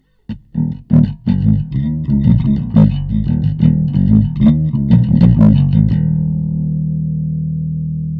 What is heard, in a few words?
bass; Nanopulse; Bass Reach